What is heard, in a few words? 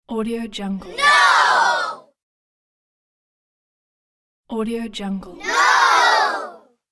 children shout "no"